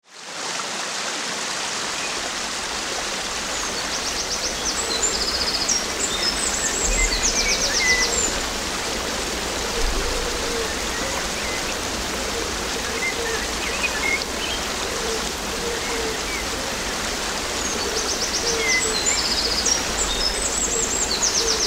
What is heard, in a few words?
Waterfall Forest